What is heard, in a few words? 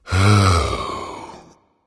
content, voice; smite; voicepack; ymir